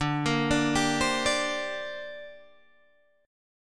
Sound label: D 7th Suspended 4th Sharp 5th, D, down, Guitar Standard; arpeggiated; JGuitar